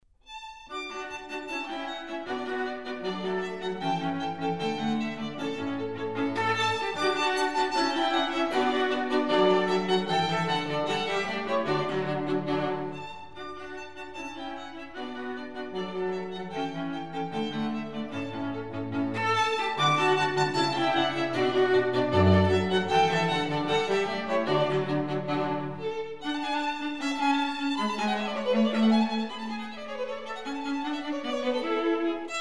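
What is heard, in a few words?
string trio; dittersdorf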